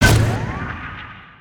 Spider Boss Fire Rain Shot; creatures mechanical

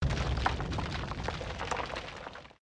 rubble; creatures organic

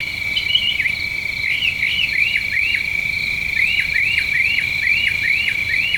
night bird loop; ambience

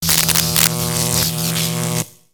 Robot Electro Arcs; Lego; ambience nexus tower

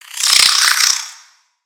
Spider Angry; creatures mechanical; Lego

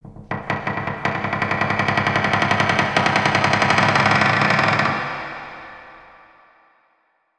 Cave Wood Creek; ambience ninjago

